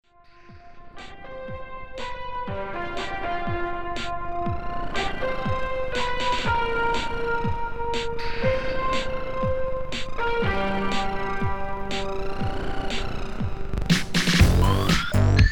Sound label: fuzz